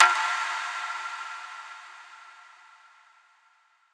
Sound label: Clarity Impact; Cymatics